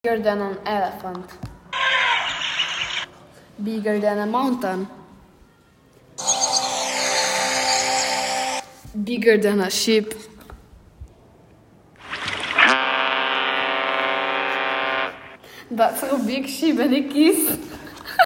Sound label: auditory song